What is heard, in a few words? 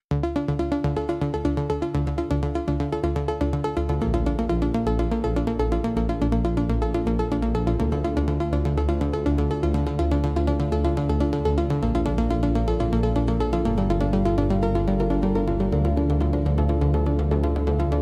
Electronic Impulse